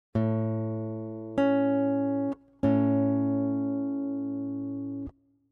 Intervalle grosse Dezime

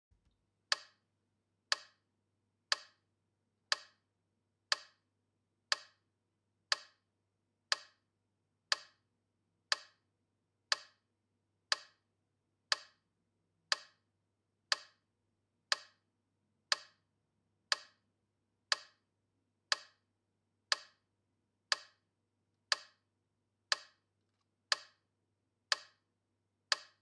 Metronom T60

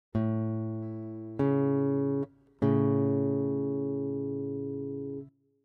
Intervalle Grosse Terz

